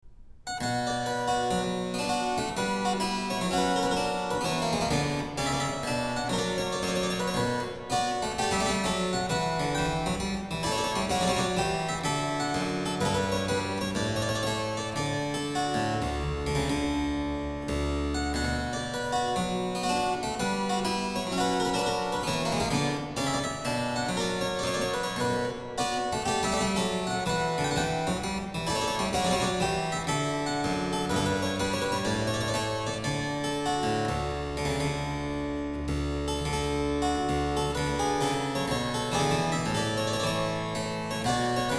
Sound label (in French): Courante